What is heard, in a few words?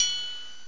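open triangle, drums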